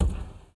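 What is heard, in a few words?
Foot Metal Roof Bare Walk; Lucius III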